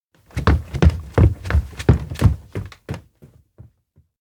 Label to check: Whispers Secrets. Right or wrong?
Wrong